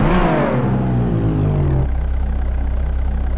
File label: throttle off fast, vehicles; enzo